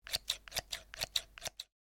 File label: scissors